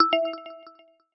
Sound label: Jump, notifications; Honor; X9c